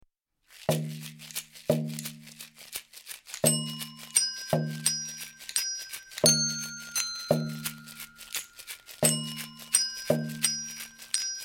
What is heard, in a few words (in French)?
chanson